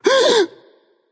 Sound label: nv breath; smite; voicepack; ganesha